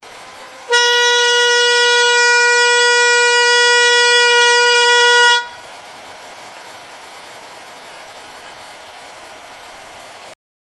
short blast of an air horn in a prank

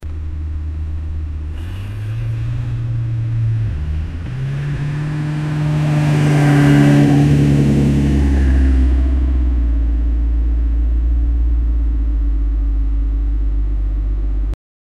car drive over speedbump

